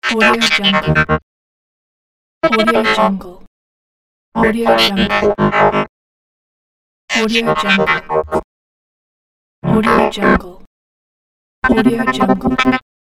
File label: droid talk